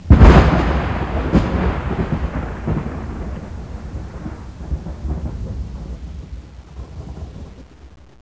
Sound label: thunder; haunted; POP1; jazz jackrabbit 2